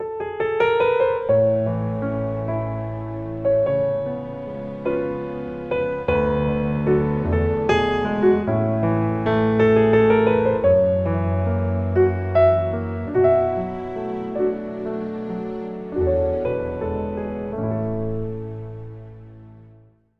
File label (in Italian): Classica Piano